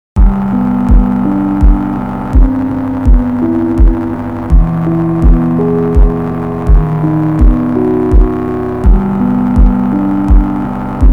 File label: Piano Improve in G